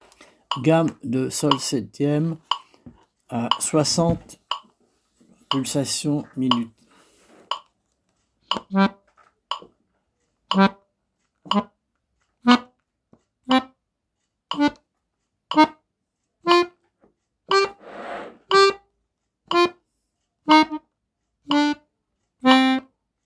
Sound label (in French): Gamme de sol 7ème à tempo 60